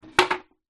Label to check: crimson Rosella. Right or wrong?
Wrong